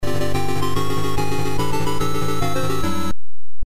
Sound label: Success Music; Tron; arcade